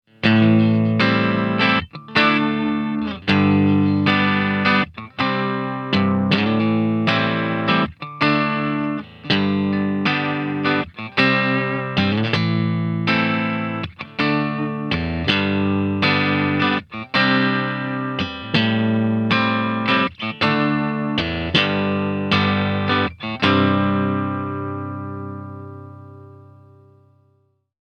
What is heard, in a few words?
Bridge Clean